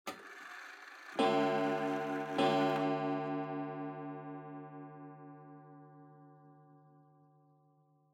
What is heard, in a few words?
Pendulum Clock Chime Mid